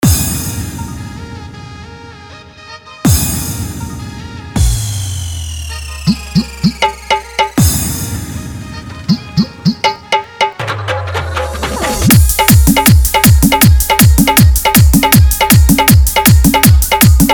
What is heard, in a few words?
Bhojpuri Old Hit Dj Song Full Dhollki Bass Mix